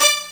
HORN HIT; E-MU Formula 4000 Series Vol. 2

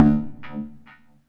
fx-percussion, Techno; Techno ID; single-drums

